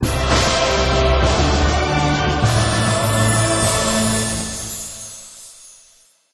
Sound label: Win; Brave Fighter 2 Monster Legion; Android